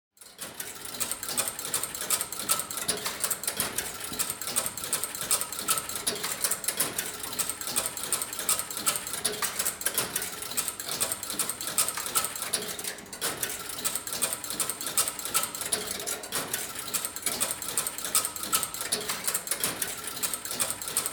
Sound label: harwell dekatron computer witch performing a regular addition sequence